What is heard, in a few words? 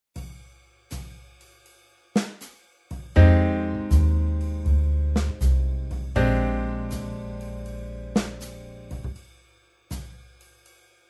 Scale; Tomosax